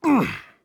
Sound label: Grunt Hit; BombKing